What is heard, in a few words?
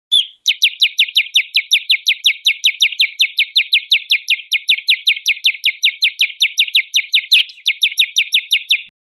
chirping bird sound recre